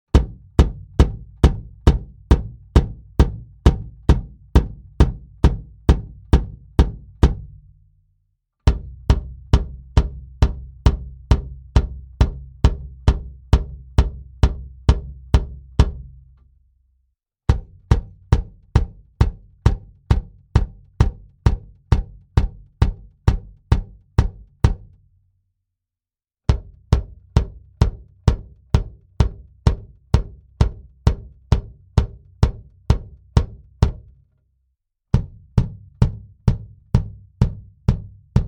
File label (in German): BD Mic Test MD441 alle Pos